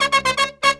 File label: Pop & Funk Brass, 120